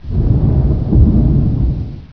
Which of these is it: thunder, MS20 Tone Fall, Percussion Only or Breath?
thunder